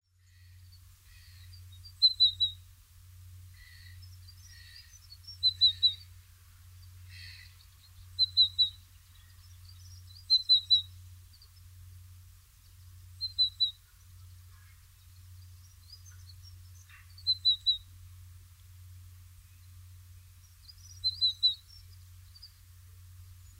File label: dunnock